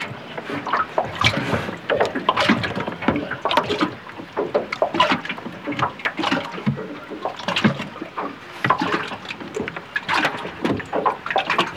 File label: Boat Rowing, Water; E-MU Producer Series Vol. 3 – Hollywood Sound Effects